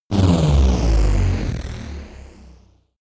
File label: break down